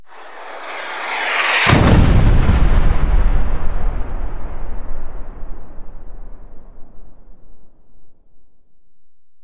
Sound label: Rocket, Slow Pass By And Explosive Impact; gun and weapon sounds; captain audio; fully loaded